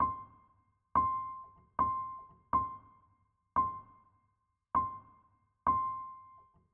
dampfer

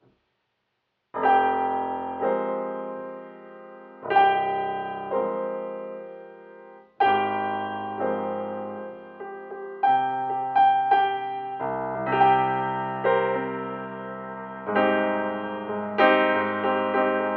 Piano loop tape; Waves Abbey Road J37

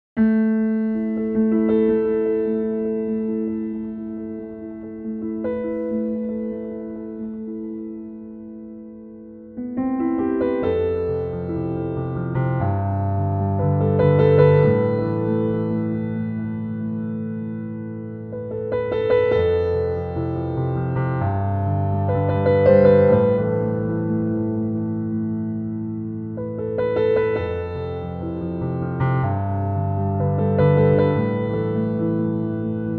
Steinway D Inside; NY

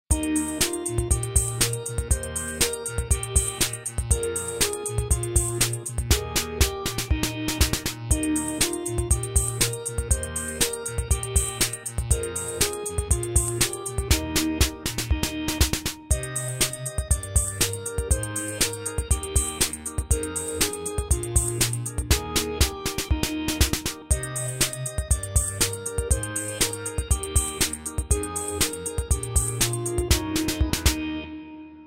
Simple Song New Chords

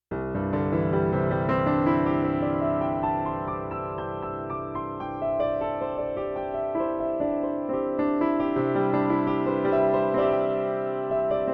clean jazz

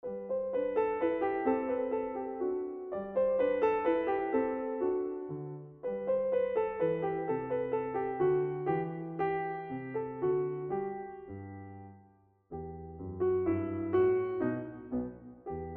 Minuet (3rd party reverb)

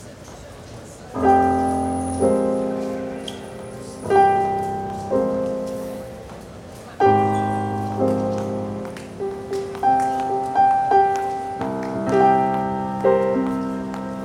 Piano loop tape; Mix Checker Pro

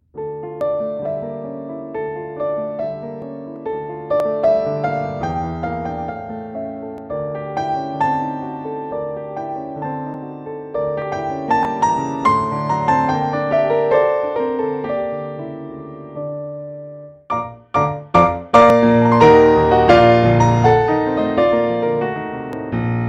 Rhapsody, Grand

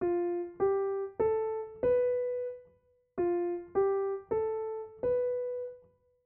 dampfer

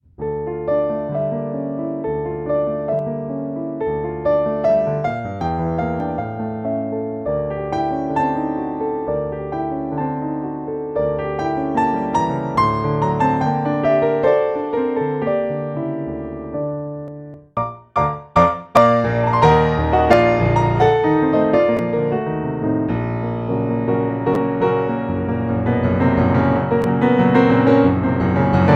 Bluethner Grand

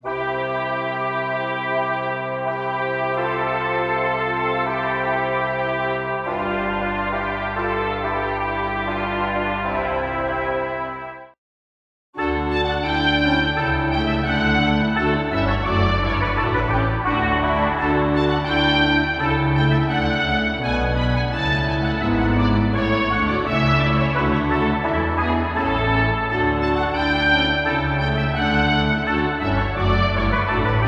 Trumpet sounds

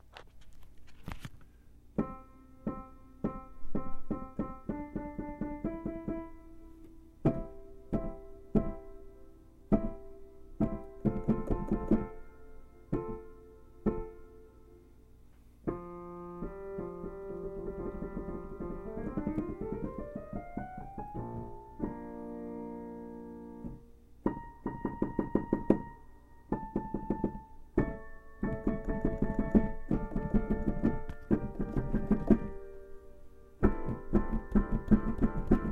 Latency Test Pianoteq

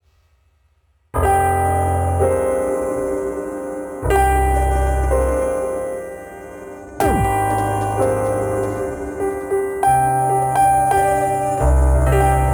Piano loop tape Multi FX; Personal Multi FX B

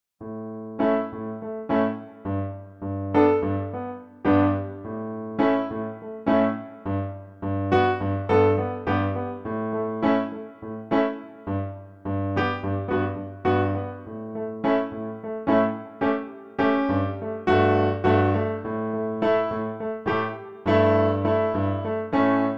C3 Crisp

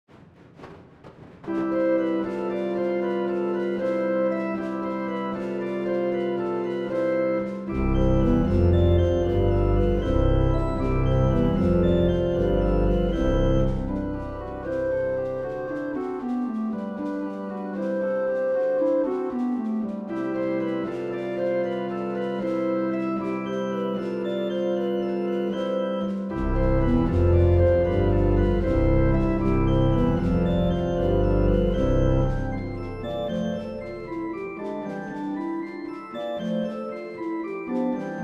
OrganTeq; Schumann Reaper s Song

